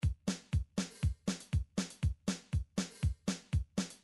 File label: drums ethnic, midi drum patterns